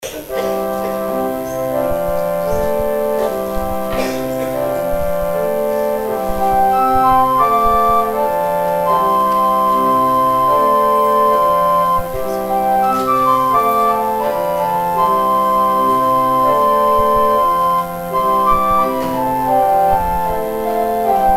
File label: organ interlude